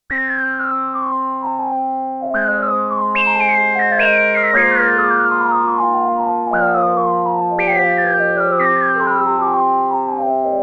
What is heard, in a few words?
Marion Systems MSR2 Seagulls